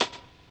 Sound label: RAGGA; Spectrasonics; Metamorphosis; RAGGAVYNL